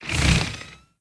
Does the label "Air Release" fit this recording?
Yes